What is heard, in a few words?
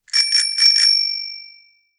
bell sound